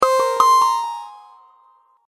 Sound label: Wrong Interface Sound, Button Sound Effects